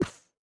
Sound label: Sticker Done; StickerClone